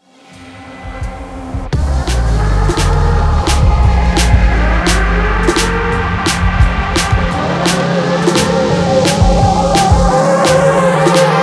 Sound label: Electronic Warfare Remix